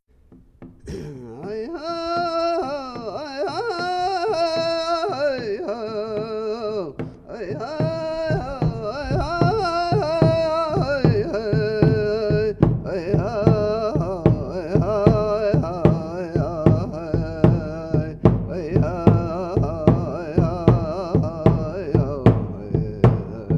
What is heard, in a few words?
Owl Dance Song